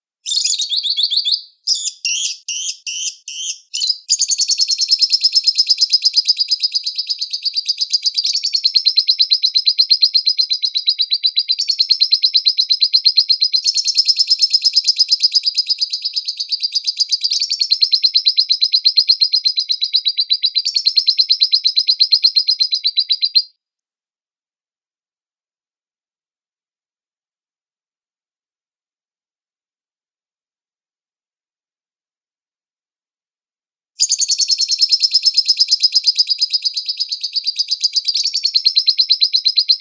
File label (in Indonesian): suara lovebird lain